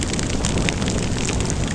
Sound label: fire loop; Ferazel's Wand Demo